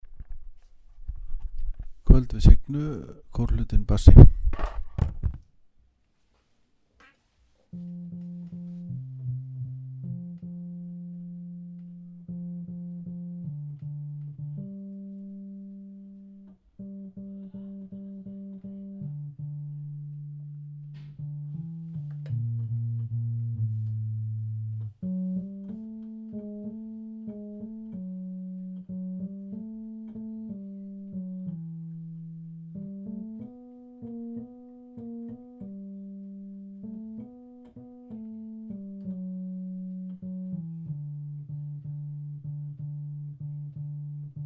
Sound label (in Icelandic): bassi